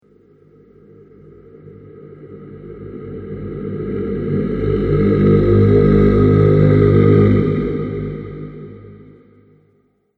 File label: Haunting Halloween Spirit, ghost